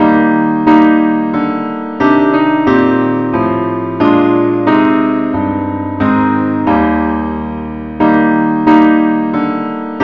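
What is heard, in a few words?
parts only key E flat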